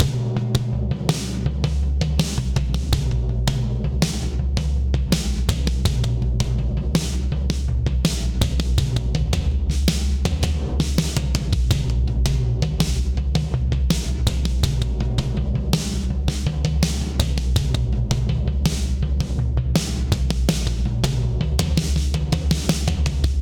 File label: Snare Ambient Loud Punch 82bpm; Drums; Tomzlla; Ableton; Factory Packs; Session Drums Studio